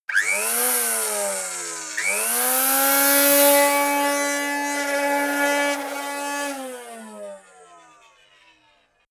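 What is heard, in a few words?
string trimmer, garden; haus-garten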